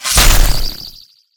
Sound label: Scratch